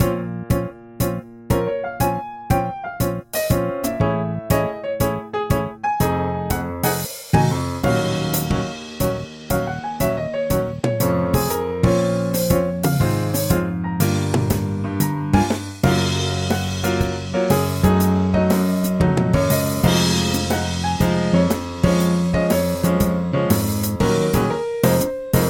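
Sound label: last melody piano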